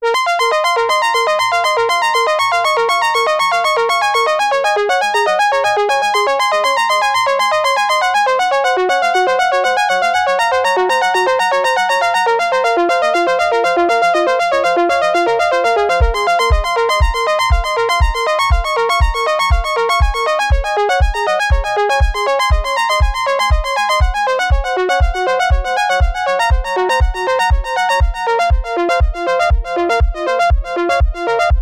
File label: Riff Echo Brass Variation; Riff Teaser